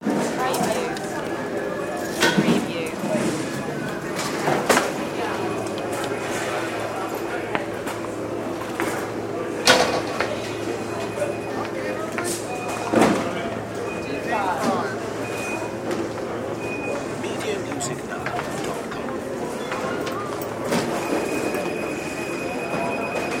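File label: Airport Security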